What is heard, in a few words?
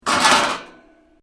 METAL PLATES CRASH; Metal Sound Effects; Metal (Sound Effects:Materials)